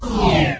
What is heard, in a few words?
satcam down mini; There; ThereClient